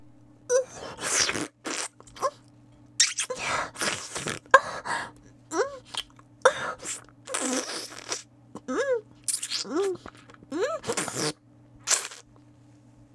喘ぎ声フェラ音, ASMR

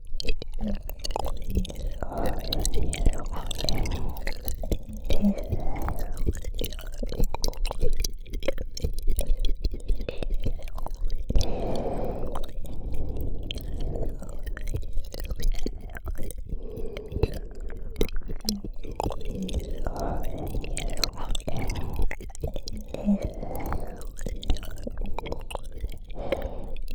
耳舐め

